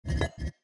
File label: Blink Up N Down